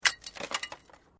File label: Metal Light Movement Minor